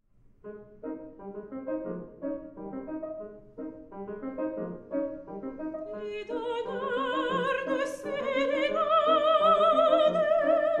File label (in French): mandoline; G. FAURÉ (1845-1924); 5 mélodies de Venise, op. 58